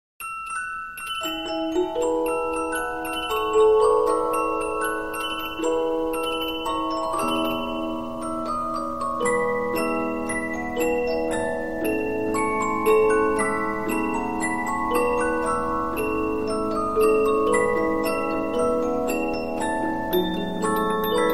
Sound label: Polyphon, 15inch disc musicbox